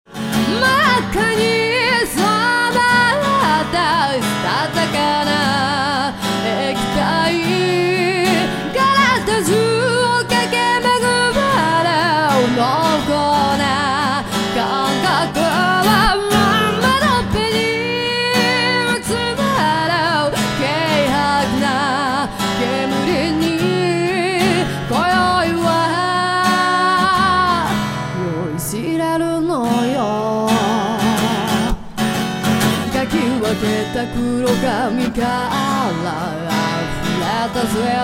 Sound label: music